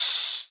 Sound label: Open Hihats, Cymbals; Vengeance Samples